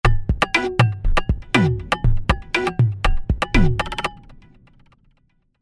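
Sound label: game background music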